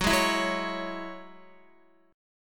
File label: Gb 7th Suspended 2nd, Guitar, Gb7sus2 chord; strummed; JGuitar